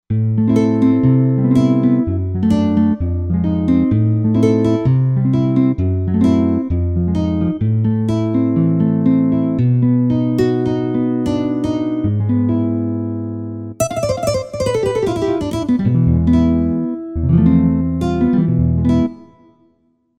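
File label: Guitar Flamenco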